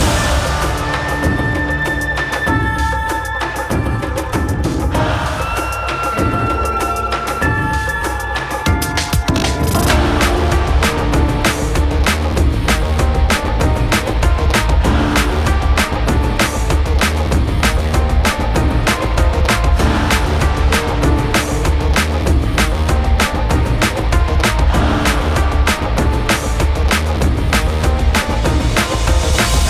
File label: oriental forest; dnf ost